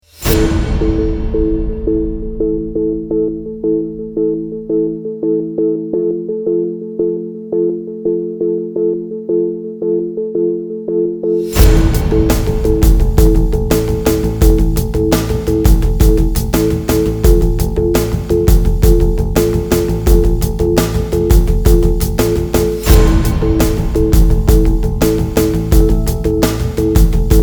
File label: massive ambient